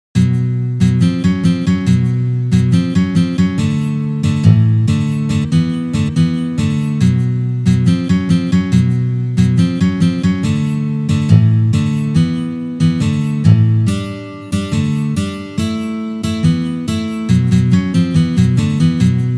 Uprazhneniya Rok na gitare

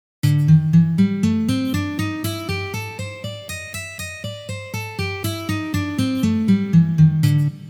Blyuzovye gammy Lya minor

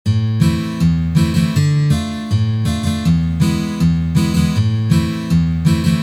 boj na gitare

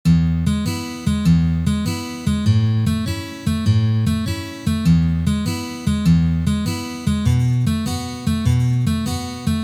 Basovye struny dlya akkordov pri perebore perebor

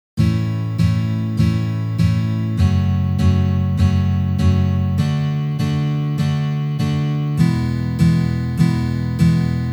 Uprazhneniya dlya igry akkorda Lya mazhor na gitare

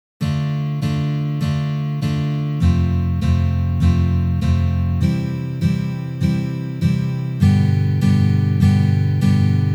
Uprazhnenie dlya igry akkorda Re minor na gitare

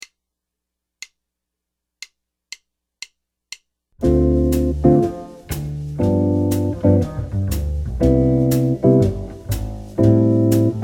Dzhazovaya posledovatelnost akkordov

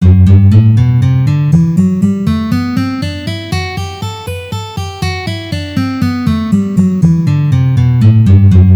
Gamma Sol mazhor Tri noty pozitsiya

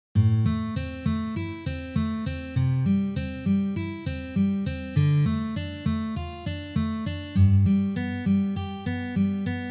Primer akkompanementa Arpedzhio

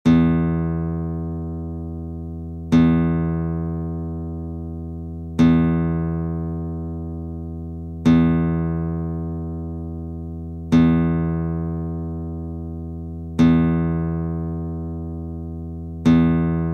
struna Zvuki strun klassicheskoj gitary

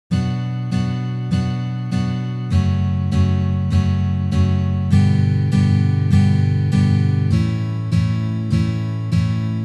Uprazhneniya dlya igry akkorda Si minor na gitare

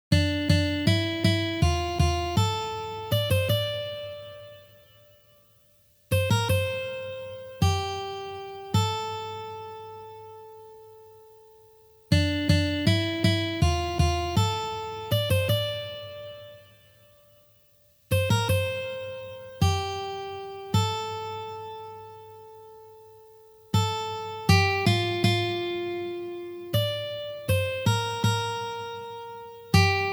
prostoj variant na 2 uh strunah

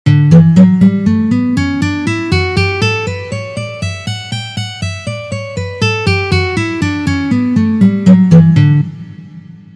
Gamma Re mazhor Tri noty 2 pozitsiya

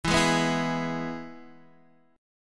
Akkord E 2 lad boj

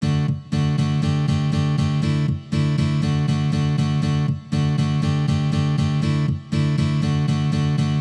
Posledovatelnost Dsus2

